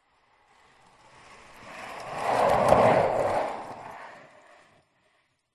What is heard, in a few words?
sport skateboard pass by skate med fast